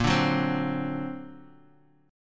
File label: D Augmented 7th A sharp, guitar